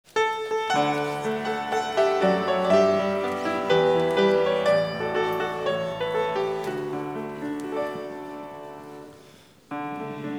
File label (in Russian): В край чудесний (укр.) мужской хор; Молодёжное общение г. Ковель